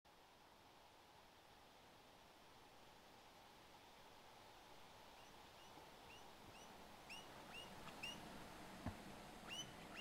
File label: Parrot soundscape